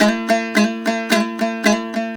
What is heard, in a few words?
HALAM MELODY; Best Service; World Colours